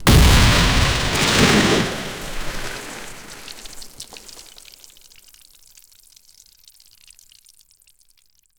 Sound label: following a sloppy fart, gloopy